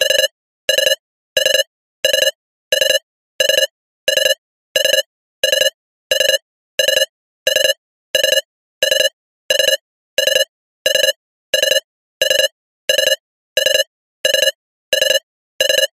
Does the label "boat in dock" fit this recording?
No